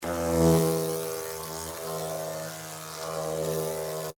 Wasp Fly Wing, Take Off, Land, Buzz, Close, Insect; Animal, Wasp; Bug; Hornet Sound Effects; BlastWave